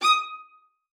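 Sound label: D#6, viola spiccato; Xsample VOL-5; 90 s Sample CDs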